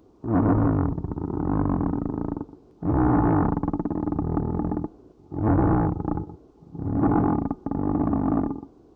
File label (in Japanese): umeki; ヴーヴー; 気持ち悪いうめき 未知なる怪物 ゾンビ